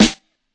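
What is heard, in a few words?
classic hip hop snare; STYLE KITS; Classic Hip Hop Mega Kit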